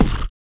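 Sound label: vox percussion, Vocal Planet Jazz FX; Spectrasonics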